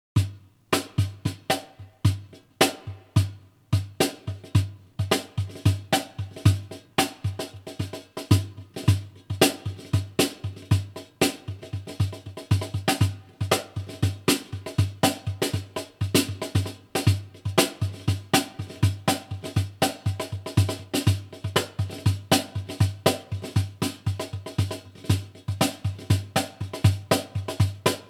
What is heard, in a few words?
cajon